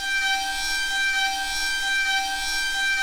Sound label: waterphone, percussion